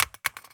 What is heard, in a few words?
phys mag drop smg poly concrete, magazine drops